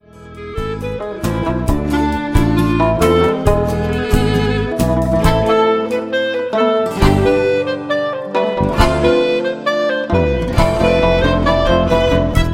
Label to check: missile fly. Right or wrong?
Wrong